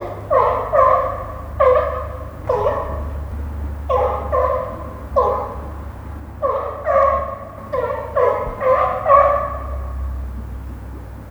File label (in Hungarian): kaliforniai oroszlánfóka takarásból szélvédő; állathangok; nyíregyházi zoo 2010